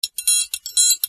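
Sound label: TRIANGLE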